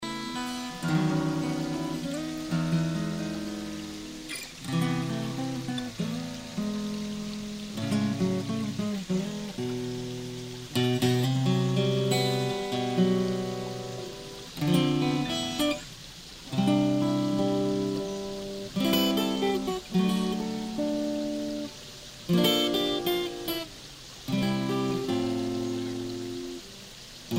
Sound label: binaural recording sample